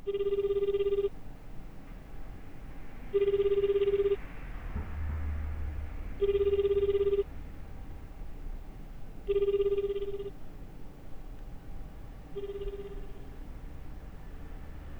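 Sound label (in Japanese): 電話コール; 出ない(立体)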